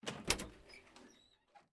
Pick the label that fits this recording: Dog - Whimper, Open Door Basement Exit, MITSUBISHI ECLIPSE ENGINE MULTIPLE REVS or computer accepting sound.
Open Door Basement Exit